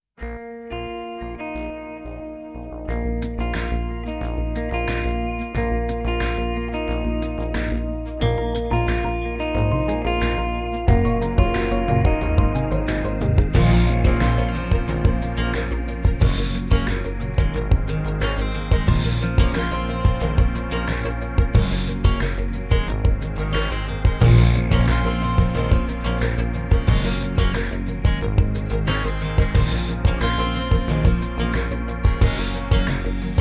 Instrumental Version

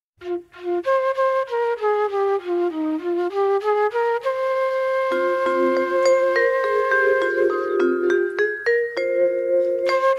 Instrumental, Mono

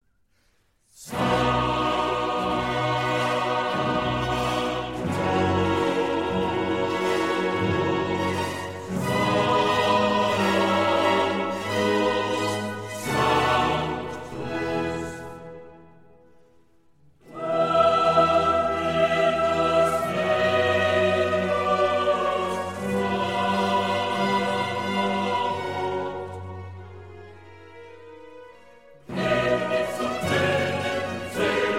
Missa brevis in C major, Organ solo, Sanctus; Mozart; K259; IV Sanctus; Mozart Complete Sacred Music

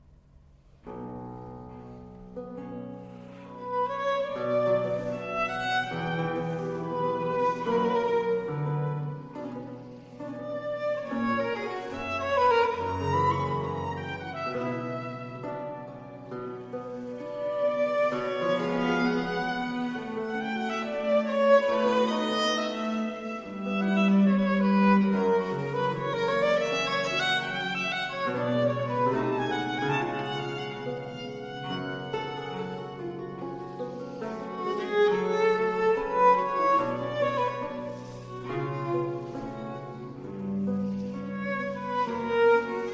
Concerto for Violin and Strings in G Minor, Largo; Vivaldi; Per pisendel

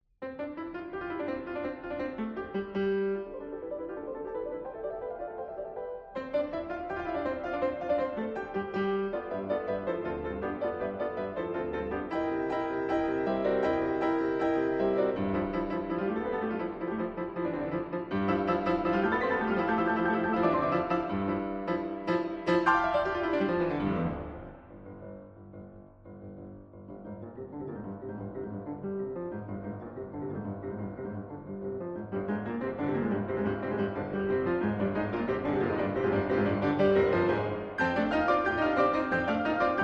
Sonata for Piano Duet, Final; Laurence Fromentin

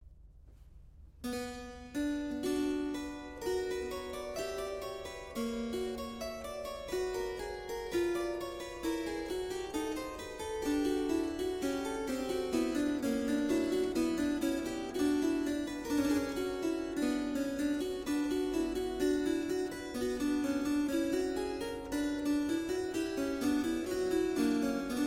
Canon 1 A 2 (Cancrizans) - Clavecin; Bach; Musikalisches Opfer

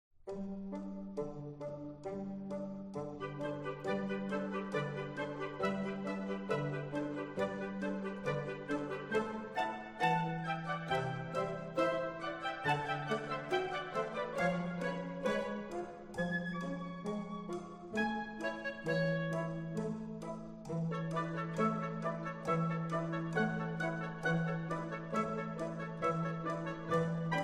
Hornpipe Polka; Citizen Kane (Music From The Motion Picture)

